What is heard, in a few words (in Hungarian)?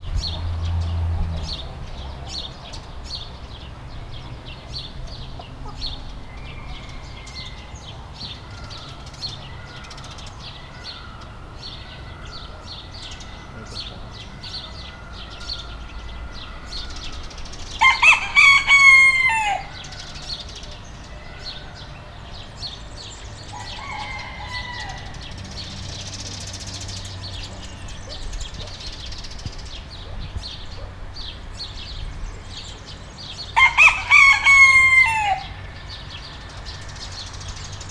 kakas kétszer; állathangok; nyíregyházi zoo 2010; paraszt udvar